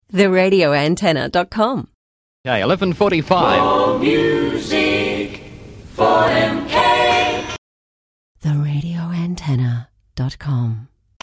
jingle; Aircheck; 4MK; 1976

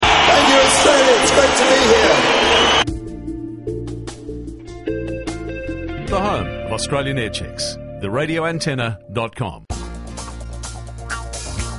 instrumental segue; 3DB Melbourne; 1970s

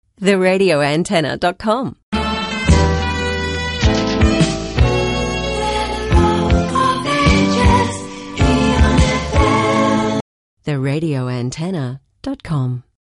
Jingle; Aircheck; EON FM

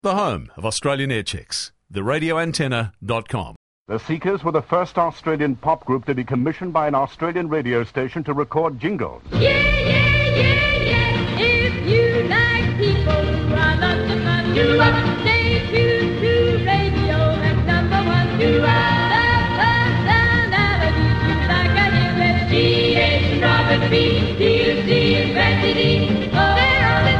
Radio Jingles; 3AK

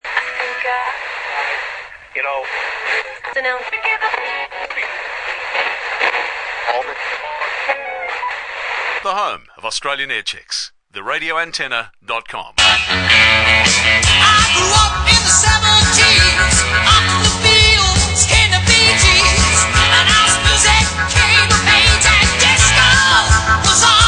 Jingle; 4KQ; 2013

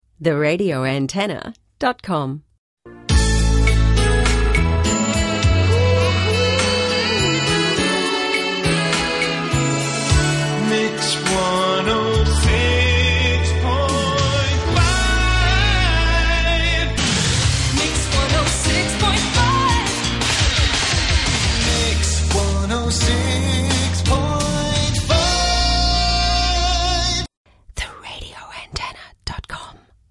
jingles; MIX 106.5; Innaugural; 1992

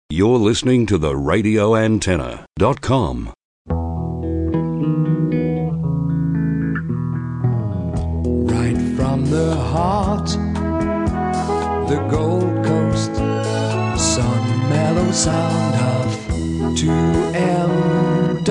Aircheck Jingle Mellow Music

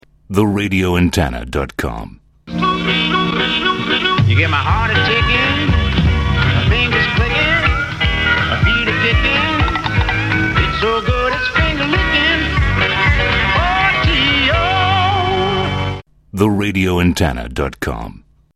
Jingle 70s; Aircheck; 4TO

